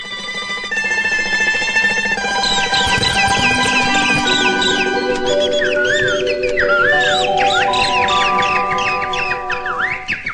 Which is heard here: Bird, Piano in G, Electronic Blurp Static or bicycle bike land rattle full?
Bird